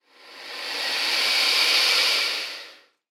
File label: Alligator Hiss, Alligator Hiss Fast; Angry; Animal